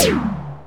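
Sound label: percussion, analog drums; Roland L-CD701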